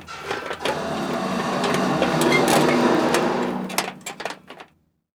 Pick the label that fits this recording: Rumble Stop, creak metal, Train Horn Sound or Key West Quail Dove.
creak metal